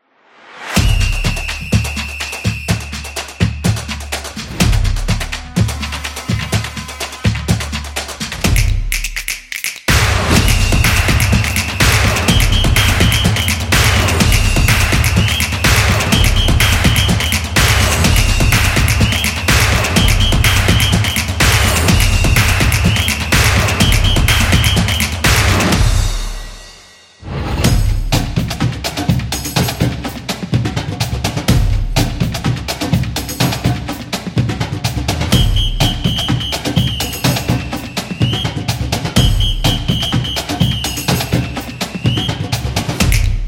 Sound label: Soccer Intro